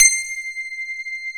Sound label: piano C 3; AKAI S-Series CD-ROM Sound Library VOL-8